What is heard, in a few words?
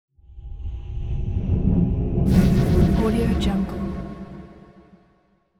fantasy magic shadow spell; esmteam